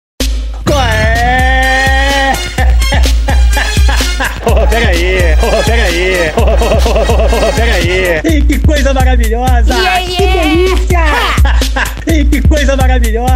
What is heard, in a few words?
funk